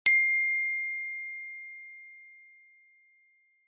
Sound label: iphone